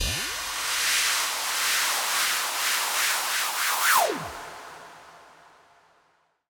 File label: Riser 120 BPM C; Syba; Syba sample pack